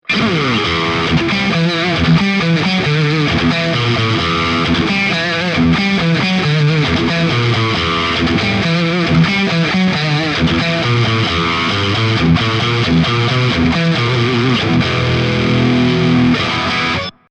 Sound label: Metal Mid Bridge Single